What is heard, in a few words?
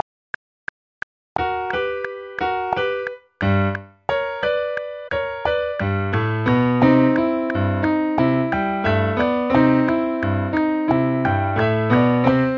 Dixieland Jam with metronome